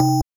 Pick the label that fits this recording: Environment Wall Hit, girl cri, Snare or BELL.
BELL